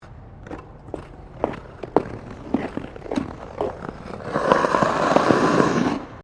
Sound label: Ice skating Maneouvering Slide, Figure skating; Ice Skating (Sound Effects:Sports)